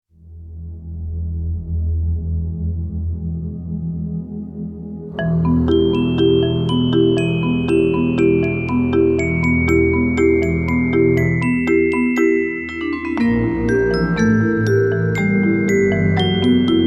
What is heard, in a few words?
Double Drums, Nutcracker